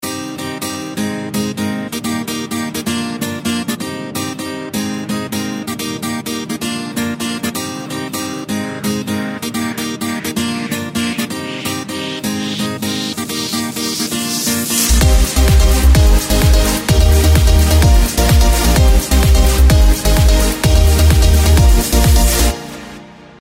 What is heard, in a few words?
Guitar POP